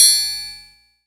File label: INS TRI OPN, TRIANGL, Ragga & Dub Reggae